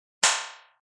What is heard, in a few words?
Metal Fizzle; Metallic; Electronic